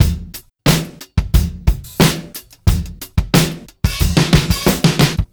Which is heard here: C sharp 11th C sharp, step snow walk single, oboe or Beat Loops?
Beat Loops